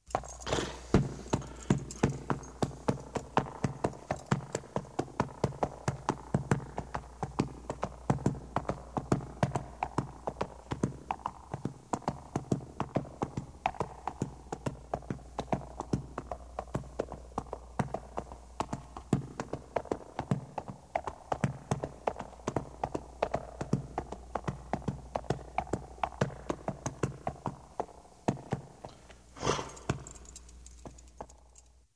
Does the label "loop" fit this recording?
No